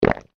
human swallow gulp; Eating; McKinney Sound

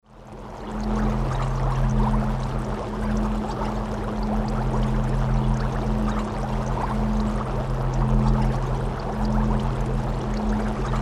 Horror Laboratory Electrical Arcs Water Boil; Scary Soundscape

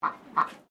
Bird Duck Peking Quack; Birds from Collingwood Children's farm; Birds (Sound Effects:Animals)

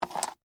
Game Dice Up, Pick, From, Board; Sports; Board Game Sound Effects; BlastWave

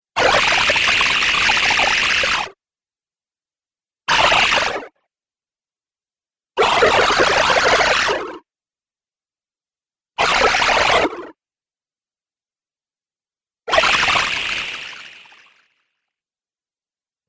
Wacky Water Video Game, Video Game Sound Effects

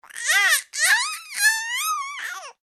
human baby 3 months upset single cry; Babies; Voice; McKinneySound

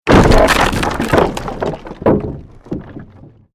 created wooden structure crash medium

